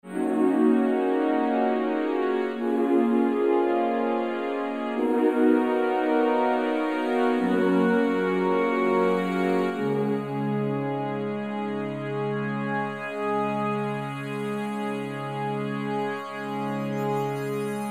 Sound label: HD Waveseq; amore&guerra(2006)